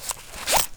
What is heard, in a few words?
holster out, weapons firearms